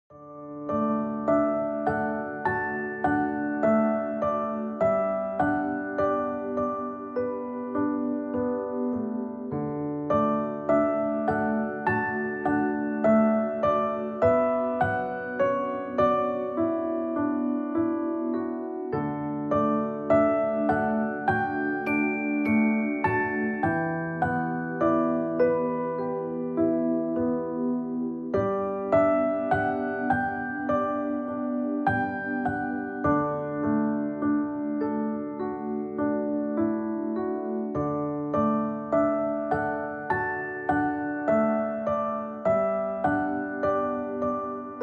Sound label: Piano Relaxation